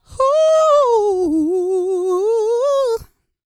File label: GOSPEL